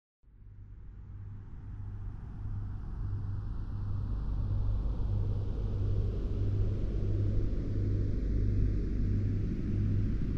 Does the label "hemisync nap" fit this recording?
Yes